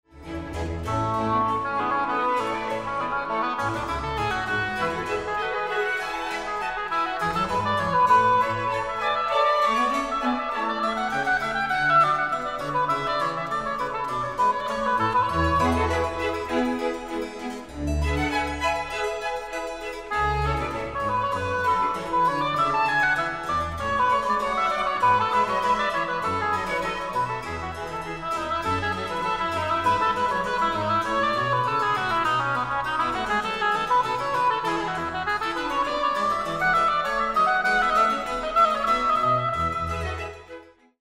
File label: Bach Oboe d Amore; CTO 1st Mvt